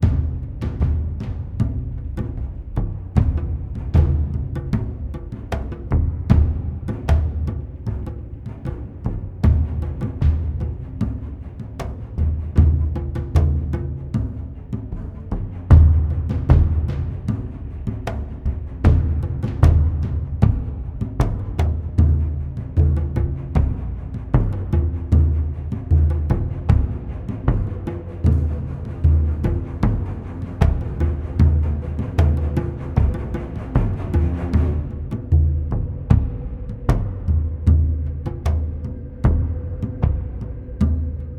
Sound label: Drums of the Deep; Sherlock Holmes Crimes and Punishments